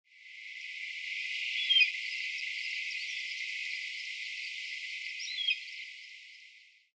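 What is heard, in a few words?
common buzzard, tatsuno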